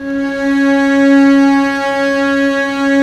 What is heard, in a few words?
STR, Vcs Sordino; STR Vcs Bow FX